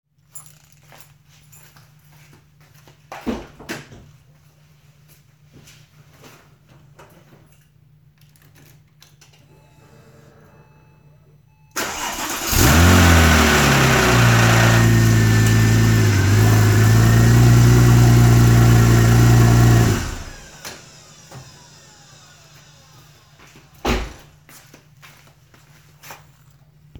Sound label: chain rattle on startup 996 turbo startup